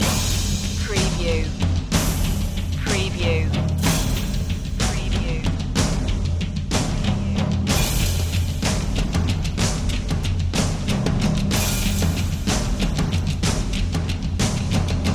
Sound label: onslaught percussion loop